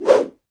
Atk Wand; Seer; GamigoNA

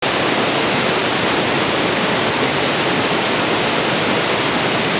Waterfall; Seer; GamigoNA

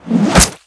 attack

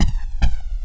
flame run

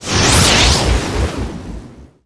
Harm Defect; Qickskil

